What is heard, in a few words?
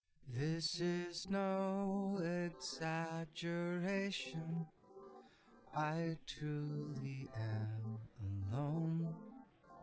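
with drums instantaneous source; SiSEC10; underdetermined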